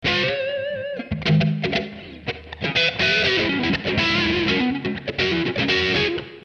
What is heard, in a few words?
D 120 125 blues rhythm